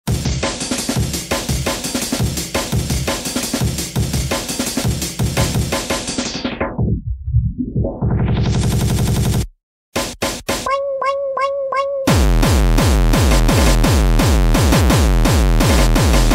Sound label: core hardstyle